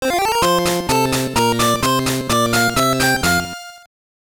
Jingle; Pingu Sekai de Ichiban Genki na Penguin; GB

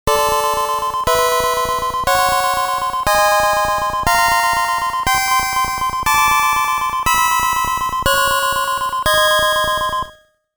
Continue; Image Fight; gamerip Famicom

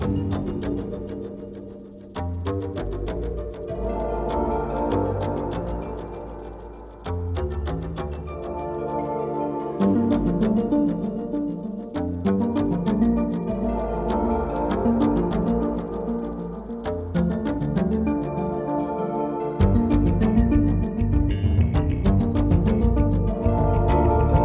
stage music; primal light linux macos switch windows xbox one xbox series xs gamerip 2020

